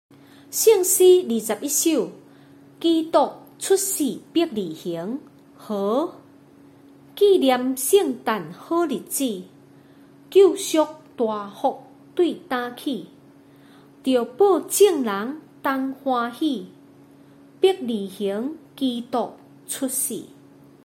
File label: chorus, hymns for christmas; christ is born in bethlehem